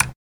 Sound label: click button